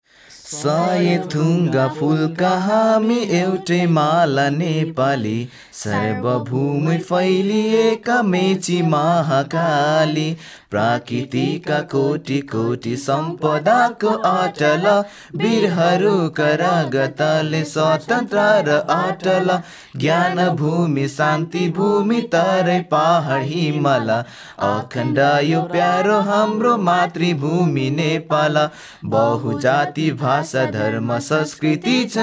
Nepali song

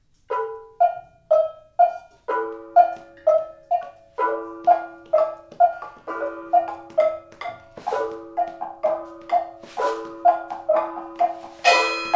Jam; Blind Youth Audio Project 2012

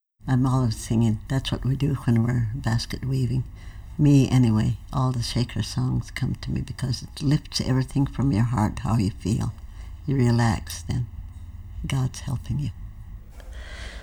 Indian Shaker Song

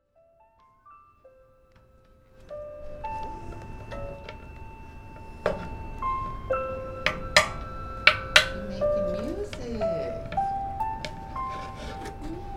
Kalimba

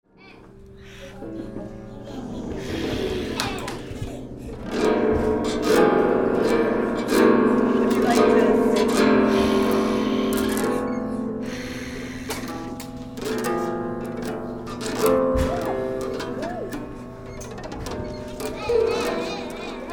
drums mallets; A Different Universe Lowell Poetry and Song 2025

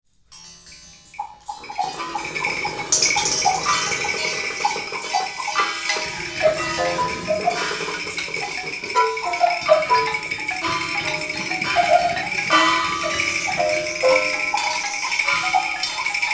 Jam; Blind Youth Audio Project 2012

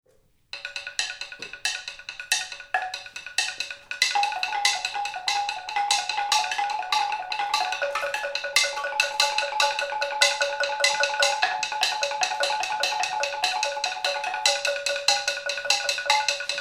Jam; Blind Youth Audio Project 2012

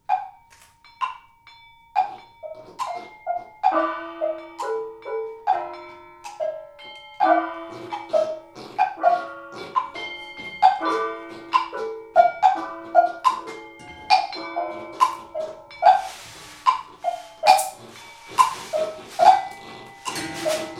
Jam; Blind Youth Audio Project 2012

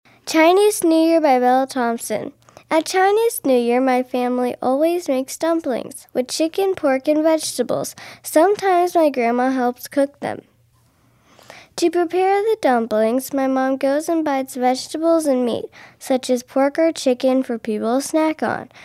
Bell

